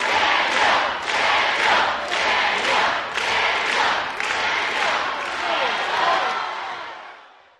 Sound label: Crowd Chants "Daniel"